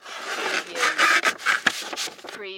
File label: Envelope Manila Insert; Sound Effects Bible